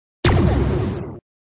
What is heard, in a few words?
Sound SFX, flipper